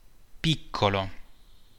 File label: piccolo